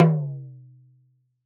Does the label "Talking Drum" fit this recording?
Yes